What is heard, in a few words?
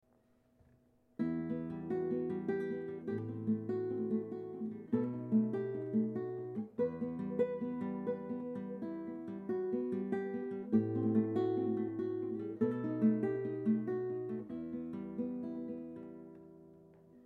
Waltz